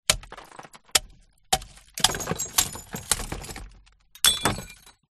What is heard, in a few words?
impact glass bottle hit on concrete block in plastic bin smash break